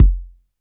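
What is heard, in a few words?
B1 Bass Drum Analog, Yamaha PSR-E323; Analog Kit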